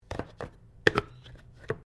JAR PLASTIC LID PLASTIC SCREW ON; Various kitchen jars; Twists (Sound Effects:Objects)

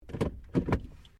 CAR SUBARU LIBERTY INTERIOR GEARS INTO PARK

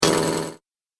Robotic Droid Noise; Robot Sound Effects

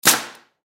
Nail Gun Shoot Thick Wood Single Brad Nail; Porter Cable; Power Tool; Blastwave

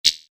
Rollover, Short

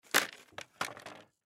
Hockey Helmet Down, Hockey Helmet Set Down Cement; Hockey (Sound Effects:Sports); Blastwave

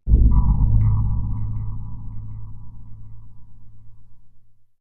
Boom Impact Sinister Dark Scary Metallic; Eerie Ominous Horror; Hits

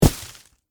Snowball Impact, Weather, Snowball, Impact, Big; Snow Sound Effects; Snow (Sound Effects:Weather); BlastWave

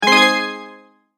multimedia tone advance; McKinney Sound

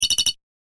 Pick Up Tokens Game Sound; Video Game Sound Effects; electronic sound effect

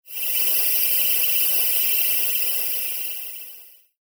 Magical Shimmer Transition, fantasy sound effect

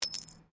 Coin Penny Single Drop Stone; Common coins; Money; Objects

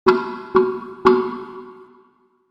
Metal Hits Slow, impact

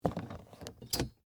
Animal Cage Pick Up; Beetles; Insects; BlastWave

